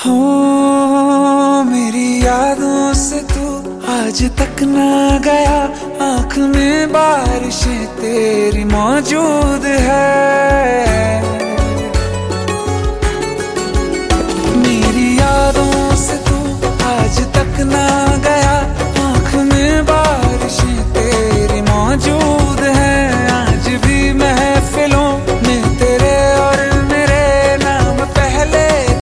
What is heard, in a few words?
ringtone bollywood ringtones